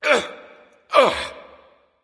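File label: nonverbal grunt lift; smite; voicepack; ullr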